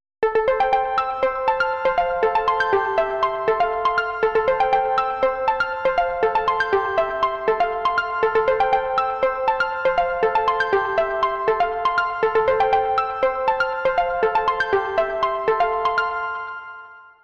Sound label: keys and plucks; beyond earth